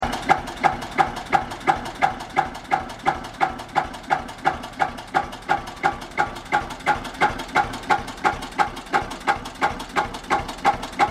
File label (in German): eicher traktor sound